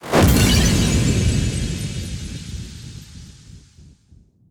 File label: win; hellbound